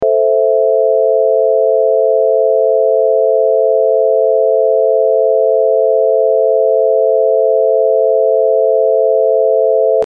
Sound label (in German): Moll-Dreiklang